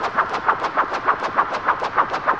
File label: Rhythm Noise, 100bpm; rhythmic inspiration